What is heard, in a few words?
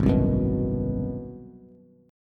G sharp 7th Sharp 9th, Bass; G#7#9; strummed; 4,3,4,4; JGuitar